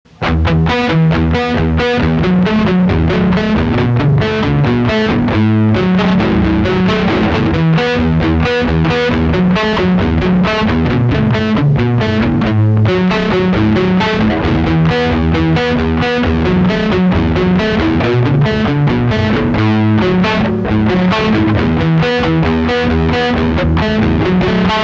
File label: strat